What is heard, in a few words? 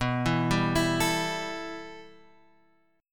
B 7th Suspended 4th Sharp 5th B; B7sus4#5 Chord {7 7 5 X 5 5} arpeggiated